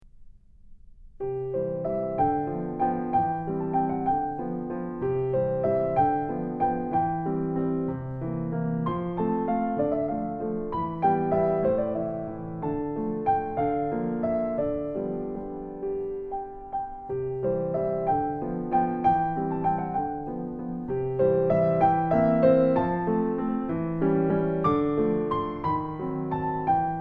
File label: Moonlight Waltz